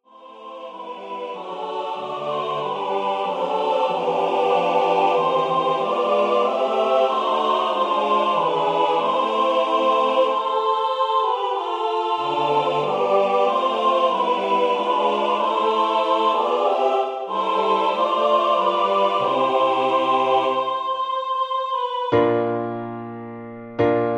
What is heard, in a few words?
MIDI SSATB